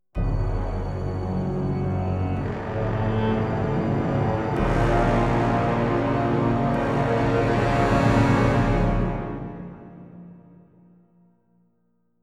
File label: Death; Fallout: New Vegas